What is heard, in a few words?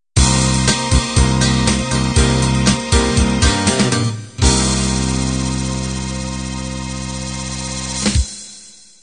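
pop 16 beat end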